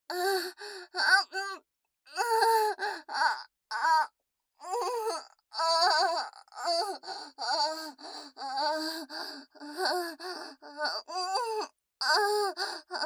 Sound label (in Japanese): 喘ぎ声